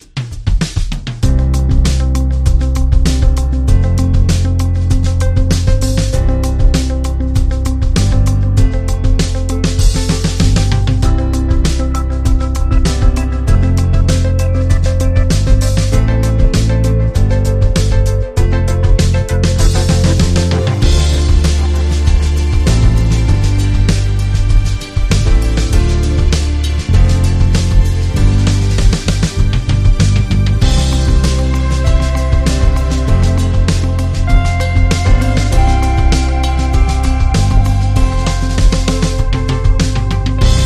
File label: Positive vibes; Bootflare